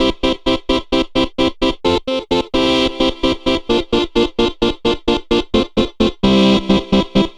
Distorted Farfisa; Keys; Dr. Fink's Funk Factory

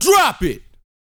VOX, Vocal, R&B Dance, HIP-HOP

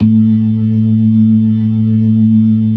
LO MAN V L, ORGAN LOWER MANUAL

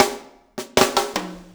SLICK

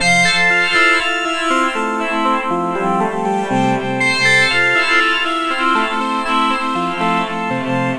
Lead, Modular Electronica; Sonic Foundry (Sony Creative Software); TSS Autopilot

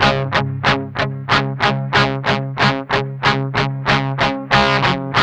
MED ROCK 8TH D 3, Guitars; Spectrasonics; Hans Zimmer Guitars VOL-1; 102-MED ROCK

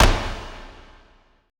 E STEPS, ETH PERC

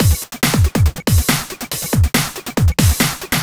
Break Beats, 140 BPM

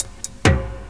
DRUMLOOP, Ragga & Dub Reggae

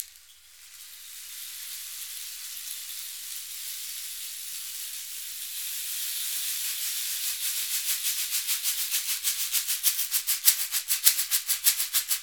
RAIN STIC; Latin Drums & Rain

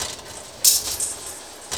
MACHINES

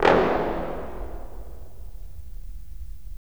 BRS, Roland LA Composer

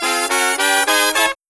SLEEP, Killer Brass Riffs; USB Soundscan vol.29 - Killer Brass Riffs; AKAI

